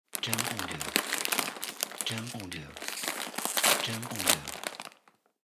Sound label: Chips Pack Opening